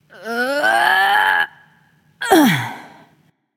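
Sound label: grunt lift, female; smite; voicepack; hera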